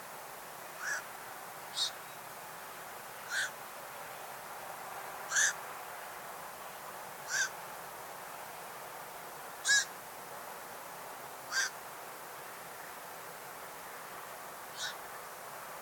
Black crowned Night Heron Nycticorax nycticora, Ladakh